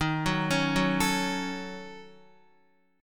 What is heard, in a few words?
Eb Minor 7th Flat 5th, Guitar; Ebm7b5; arpeggiated; JGuitar